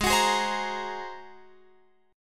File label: G sharp 9th G sharp Guitar Open F; G#9; x,15,18,15,15,17; strummed